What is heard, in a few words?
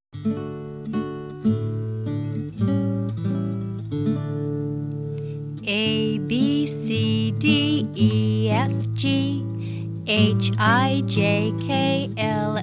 Abc Chant